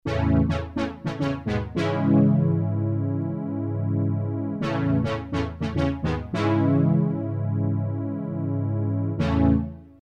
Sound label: obxa, yamaha tg500; Vintage: african brass